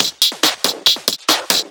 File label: Break Beats, 140 BPM